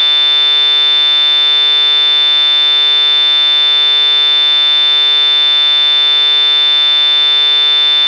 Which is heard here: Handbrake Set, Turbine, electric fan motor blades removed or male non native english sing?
Turbine